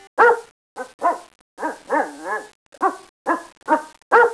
DOG; 特酷声音素材库

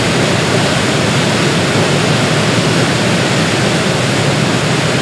Big Waterfall; 暴力赛车

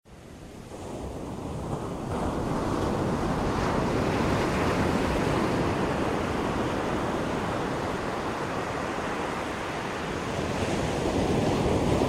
sea; SoundControl

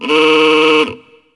Game, 游戏音效; 特酷声音素材库

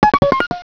PHONE, 电话; 特酷声音素材库

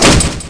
Game, 游戏音效; 特酷声音素材库

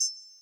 metallic, button and menu sounds; 18 button and menu sounds

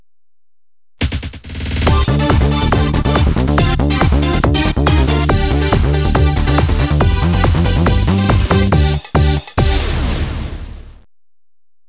dance

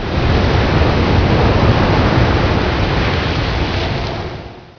Water, 自然, 水声; 特酷声音素材库

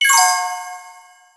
shuffle; Microsoft Link-up

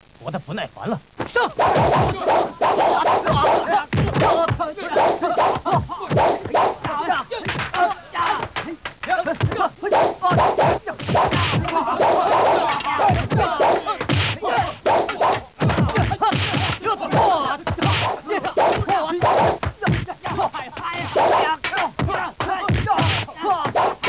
Fight, 打斗音效; 游戏音效; 特酷声音素材库

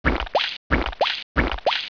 Water, 自然, 水声; 特酷声音素材库

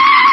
Skid; 暴力赛车

